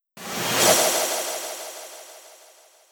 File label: Vampire Appear